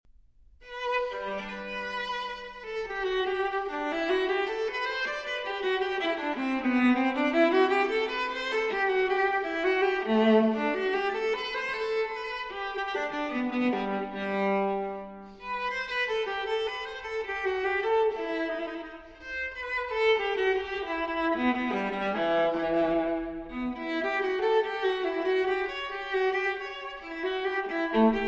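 Cello Suite No. 1 in G Major Transcription For Viola Allemande; J.S. Bach; BWV 1007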